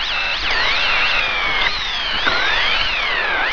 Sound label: Planet Motors Loud